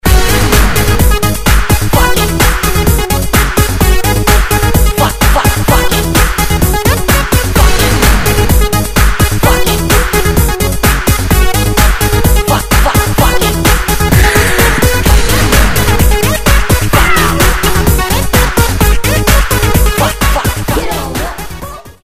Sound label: Night 128bpm; sample